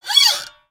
Dragon In A Box Springy; destructibles

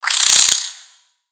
Spider Angry; creatures mechanical; Lego

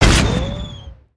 Spider Boss Fire Rain Shot; creatures mechanical

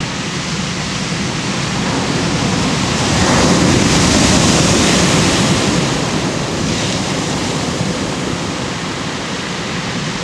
Waves; aronwk collection; ndaudio; ambience pet rock

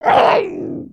Skullken Basic Melee VO; creatures organic

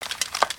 idle rattle; creatures organic

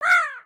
Parrot Squawk; creatures organic

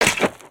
Skullken Walk Run; creatures organic

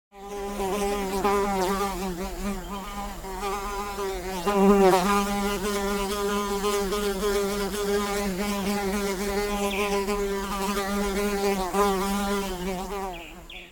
Jungle Insects; ambience gnarled forest

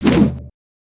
Staff Spinjitzu; weapons

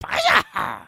knockdown; creatures organic

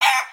cluck; creatures organic

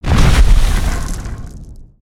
dirt crumble explode; creatures organic

